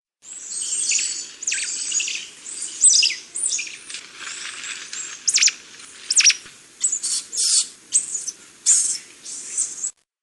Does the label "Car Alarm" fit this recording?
No